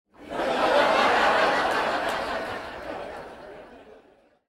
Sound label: Laugh Track; zoomerang